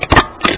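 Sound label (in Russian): шумный звук работы степлера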